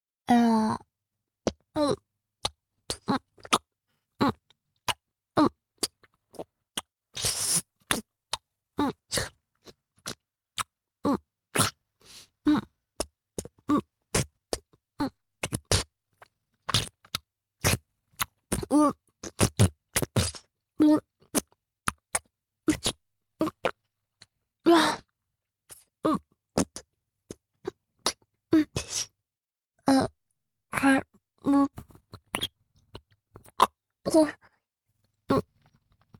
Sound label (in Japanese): フェラチオ(中):亀頭をしゃぶる; 実用ボイス抜粋集